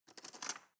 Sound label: cutlery teaspoon drawer grab